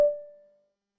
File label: pop